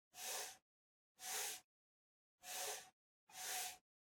PC Mouse Move Far Soft Pad Mid

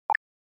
OS Sound Notification

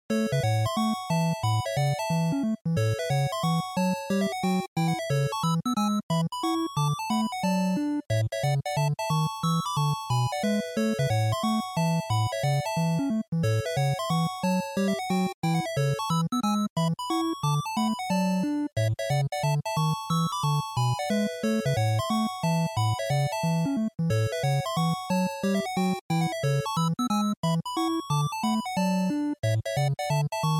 NES Sports Title Fade Out

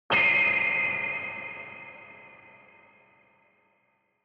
Retro Anime Accent Low Long

